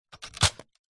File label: leisure toy gun plastic shoot